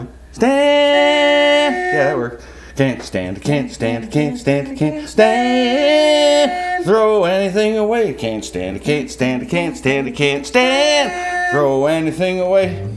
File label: Cant Stand Harmony Amplified